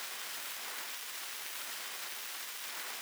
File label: grenade smoke russian loop; Escape from Tarkov